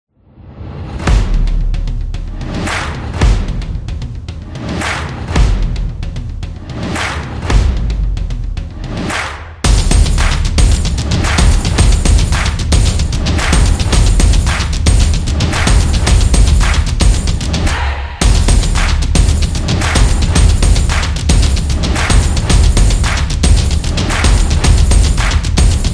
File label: Drum Intro